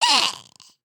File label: Die; Lego Strombie; creatures organic